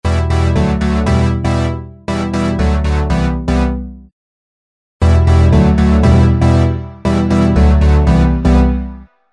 synth multidyn; waves

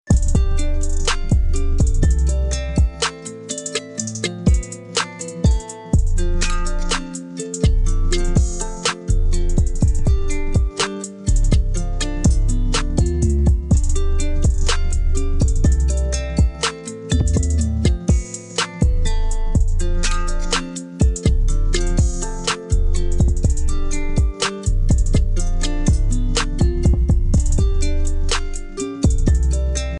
trap, Trap beat; country; suno

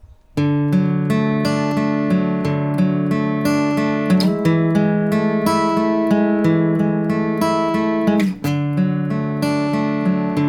guitar direct

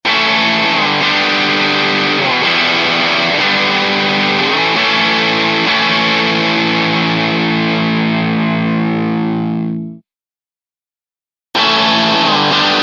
guitar; waves

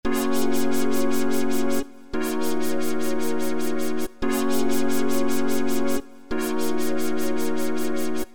modulations

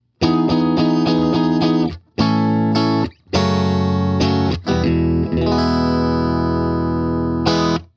Stratocaster Bridge and Middle

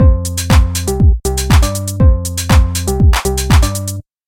Hi hats Before

